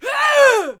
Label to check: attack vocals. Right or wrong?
Right